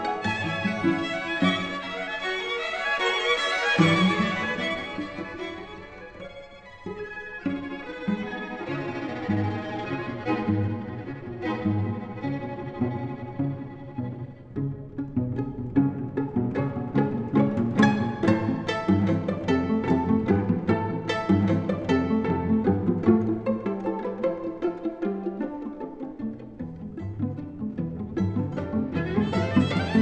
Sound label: classical